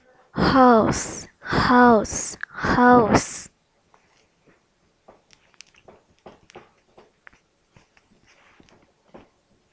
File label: hose